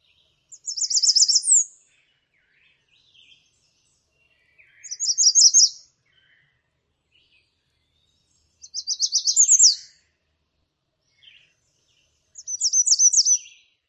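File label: American Redstart songs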